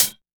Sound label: Closed HH